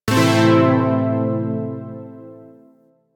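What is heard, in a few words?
Shawzin Pentatonic Major Chord K; Zariman Shawzin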